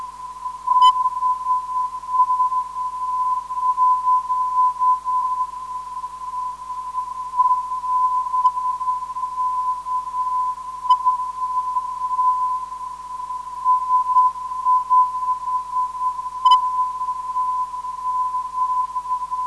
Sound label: bkq 410khz, ndb